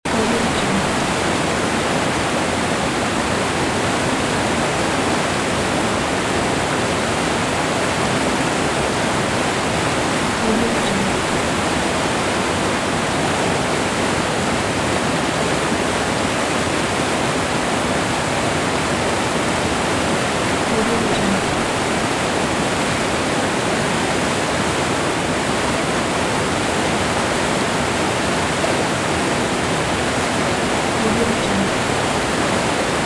waterfall loop

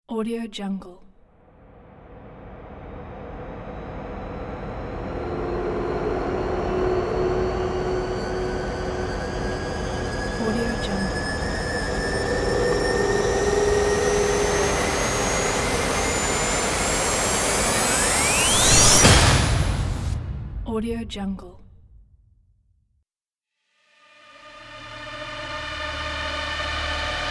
epic cinematic riser

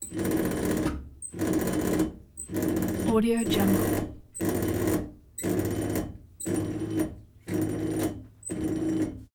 plumbing sink; prosoundfx

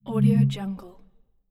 swipe

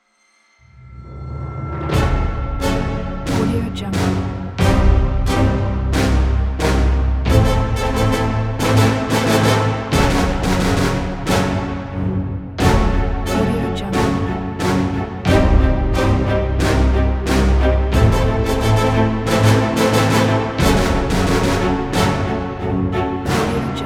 big heavy action suspense; composerbot

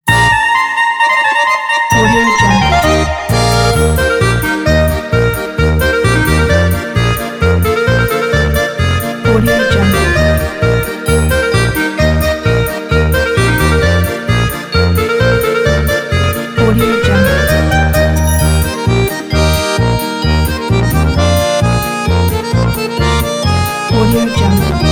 oktoberfest polka

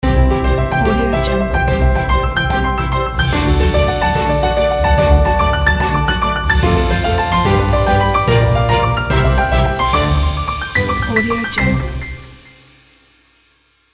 bright piano intro logo; serge-che

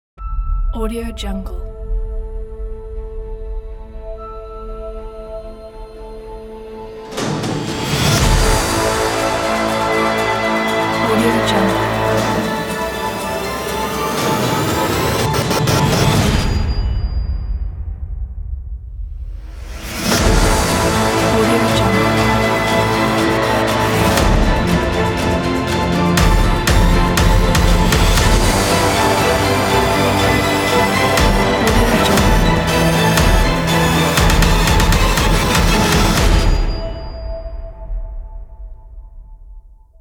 melodic destruction teaser trailer

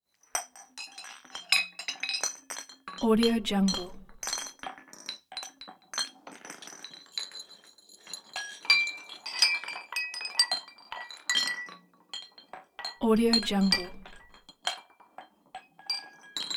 bottle can glass kitchen or bar clean up clank; prosoundfx

